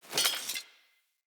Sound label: Scrap Metal Pickup